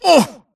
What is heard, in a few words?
grunt hits; Titanfall 2